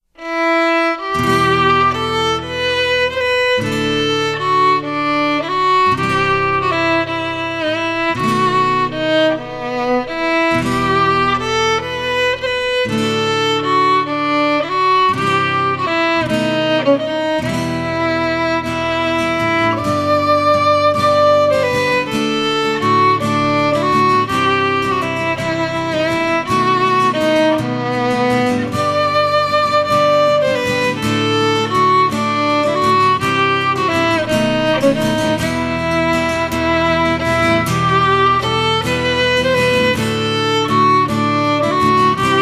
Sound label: christmas medley